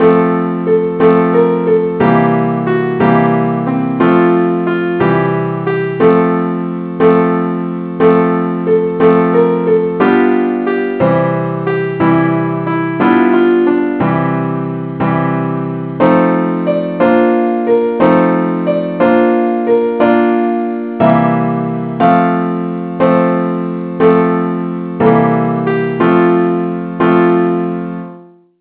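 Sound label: simple accompaniment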